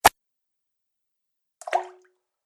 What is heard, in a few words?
Golf Shot Water Plop; Golf Sound Effects; sports sound effects